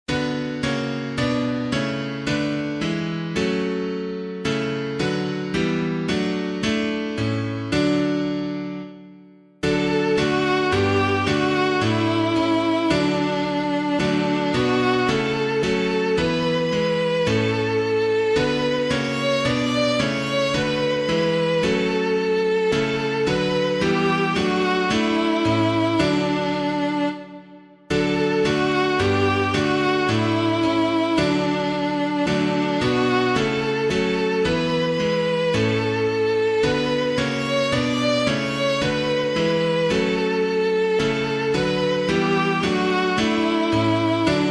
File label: piano, Hymns; Gentle Star of Ocean; Caswall; SAINT MARTIN